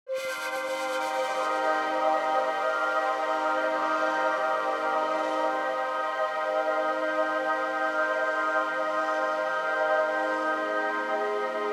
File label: ambient